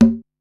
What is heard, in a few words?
Conga Low, Korg Wavestation-SR, Drum Kit; Wave 455 - Drum Kit